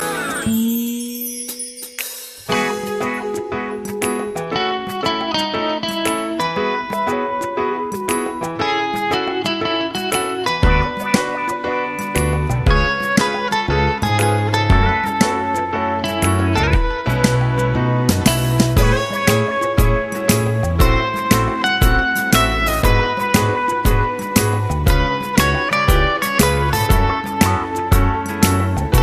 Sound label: Fullhouse Instrument